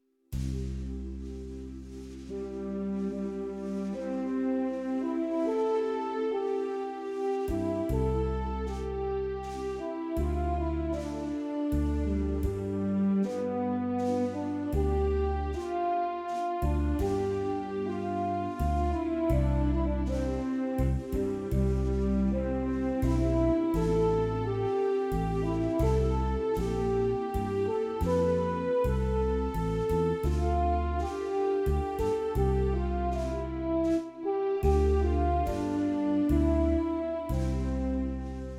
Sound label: French Horn; Roland V-Accordion soundpack best of brass